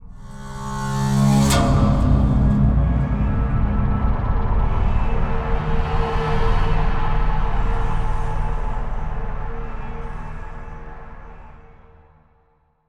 Puzzle Intro; unruly heroes

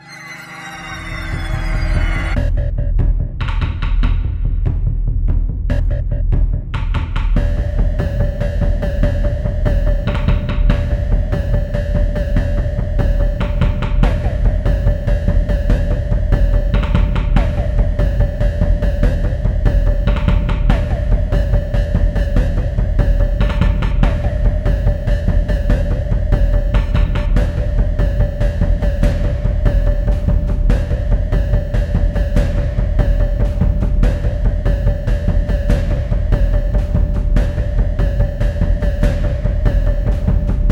Intense

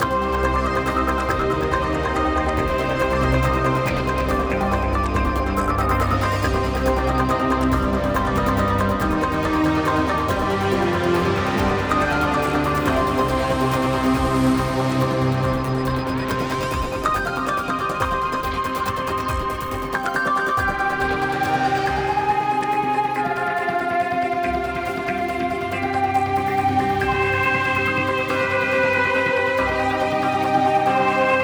Enemy Turn

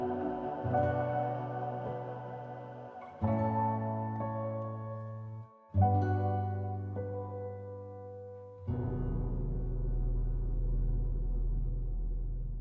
Cave Music Forest Memory Extended Last Note

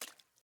water step; teardown; ps5 windows xbox series xs

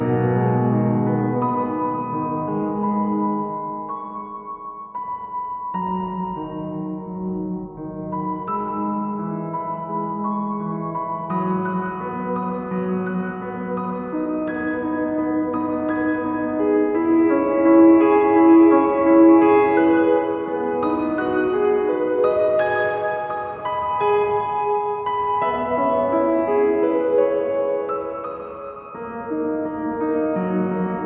Song Loop

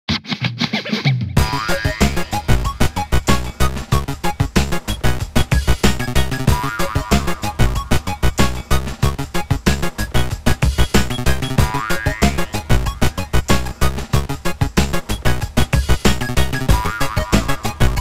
roulette menu; Yo-kai Watch 3; 3DS

